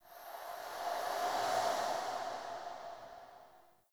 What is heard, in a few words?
Fey Eldveil Latent Random, Powers